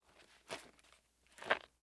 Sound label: colt45 saa cartridge box; prosoundfx